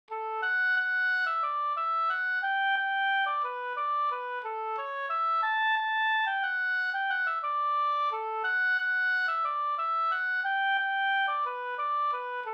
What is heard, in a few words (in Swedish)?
vals